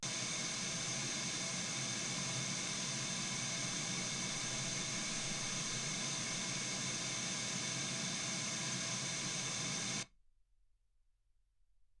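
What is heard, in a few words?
white gaussian noise 48kHz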